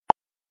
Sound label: facebook pop